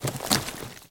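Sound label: loud rustle of dry bushes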